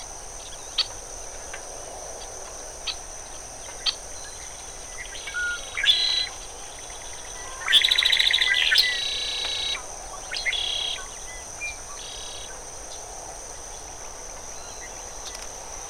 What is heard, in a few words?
Red winged Blackbird Agelaius phoeniceus